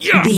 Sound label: voice male attack